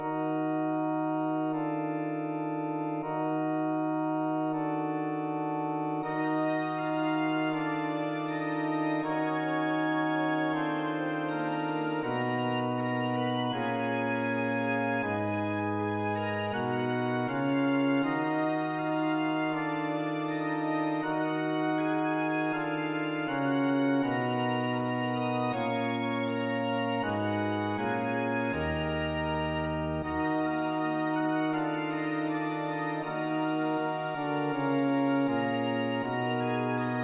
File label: Organ, Loop; Muzyka i dźwięki z gry; Heresy